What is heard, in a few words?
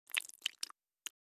Gore splatter small drops of blood